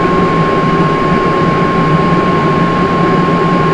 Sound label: APU Turbine, F-18